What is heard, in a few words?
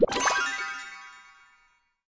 open